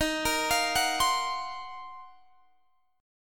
D sharp Minor 6th Add 9th D sharp Guitar Standard, chord; arpeggiated; JGuitar